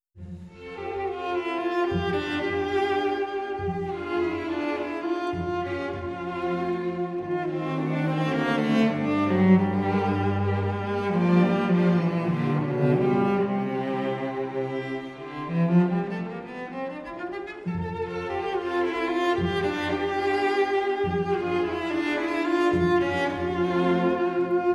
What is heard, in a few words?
Melodie for cello and orchestra; Tchaikovsky